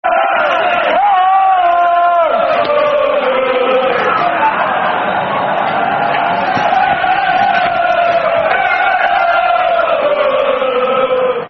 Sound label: La La La Fulham, football chant, soccer songs